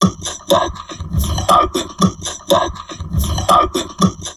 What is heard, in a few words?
TALK, Drum Loops Crazy Processed